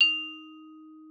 XYLS D#4, VIBES; Xsample VOL-4

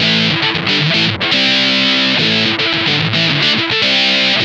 BIG RIFF, Funk Guitar; Zero G - Funk Guitar

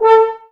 Brass F.Hrns A; Miroslav Vitous - Woodwind Brass Ensemble

